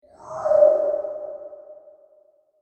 Whoosh Transition Materialize Airy Vocal Element Reverb; Whooshes; Sound Design